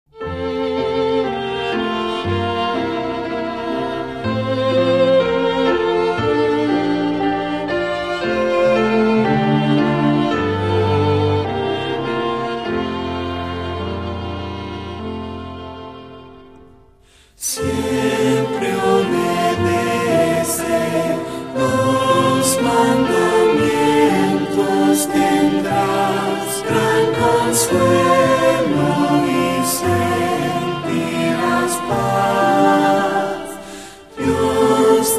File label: vocal and instrumental, hymns spanish